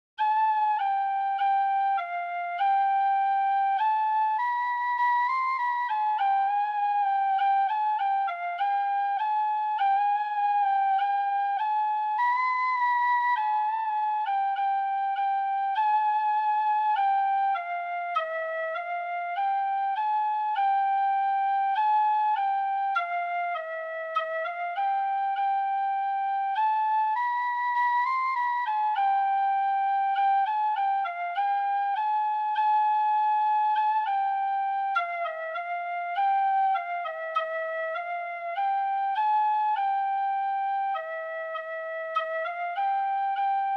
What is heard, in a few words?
irish whistle; axion estin